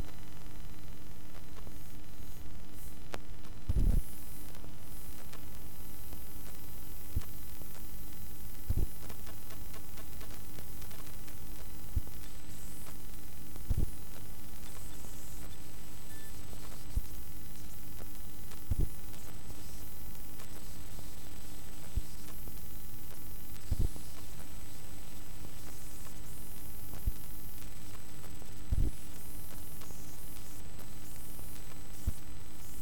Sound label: nokia 7650, telephone pickup